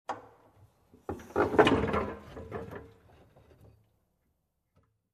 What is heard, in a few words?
Metal balls filling